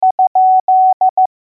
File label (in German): Fragezeichen, morse alphabet